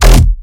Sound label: Bass Shot E; sickrate; siik essentials iv